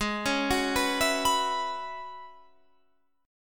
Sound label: Gb 7th Suspended 2nd Suspended 4th Ab